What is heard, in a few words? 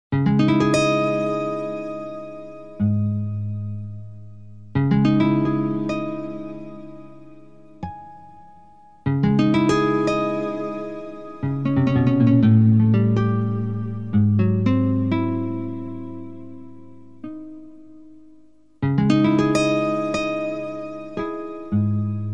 Little Guitar